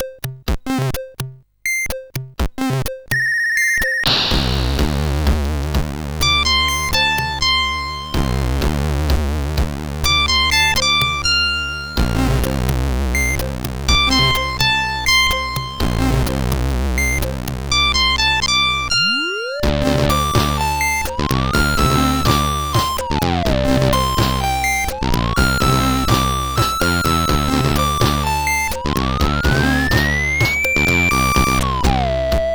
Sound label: sid CSG8580R5